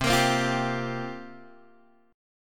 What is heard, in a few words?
C 7th Suspended 4th, Guitar, Open G